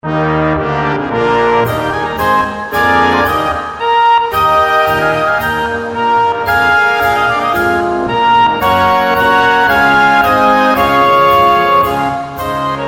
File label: Marsch